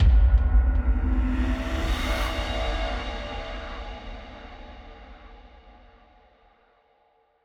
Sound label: Stingers sting misery